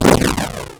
explosion; spelunky 1 1

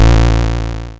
yeti yell; spelunky 1 1

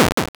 chest open; spelunky 1 1

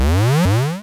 laser charge; spelunky 1 1

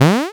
spider jump; spelunky 1 1

